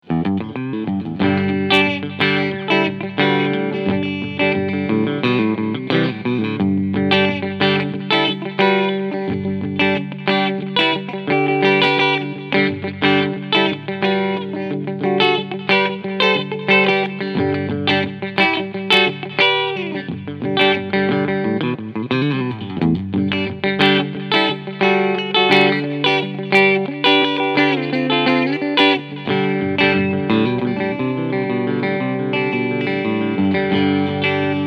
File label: Fender Traditional 60s Tele Cleansound beide Pickups